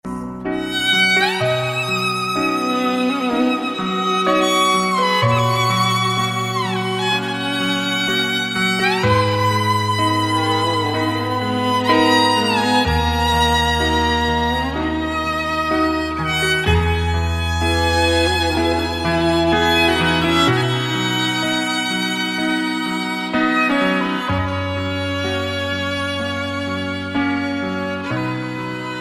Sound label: persian gray days